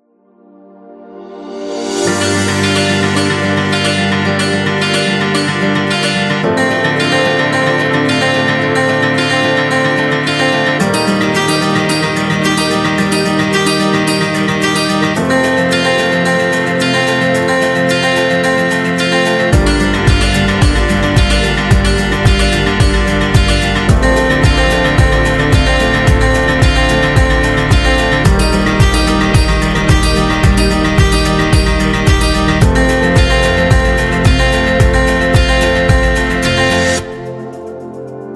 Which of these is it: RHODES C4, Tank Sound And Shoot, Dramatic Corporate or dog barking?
Dramatic Corporate